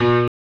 EPM PIANO, KEYS, Synthesizers

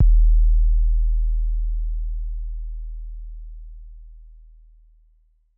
Tempest 808 Kick, Drum Machines; 300 Drum Machines; Dave Smith Instruments

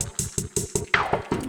Loop, Drum & Bass, 160 BPM

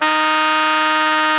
SOPRANO D#3, Saxophones, GRWL; Greytsounds; Philip Wolfe David Crozier's Saxophones

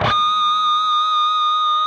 PRS PINCH, Guitars; Spectrasonics; Hans Zimmer Guitars VOL-1

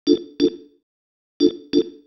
Single Riff, Organ

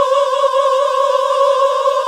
GOSP. AAAHH

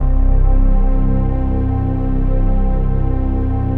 CHRD PAD; Best Service; XX Large Pads; Sample CDs

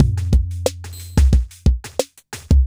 Smooth, R&B Drums

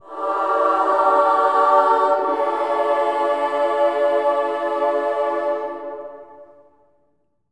amen; Spectrasonics; Symphony of Voices; BC AMENS

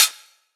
Hat; M8; Electronisounds

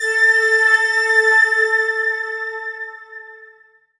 lead syn, Techno Trance

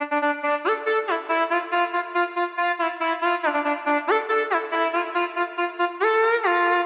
Flute 140BPM Dmin; Grammy; 99Sounds x Monosounds; Instrument Loops

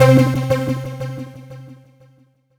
C3, SynthLine; 90 s Sample CDs; NiceBeats; SynthLine VOL-7

